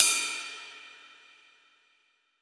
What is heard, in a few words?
Ride Cym; Roland BK-5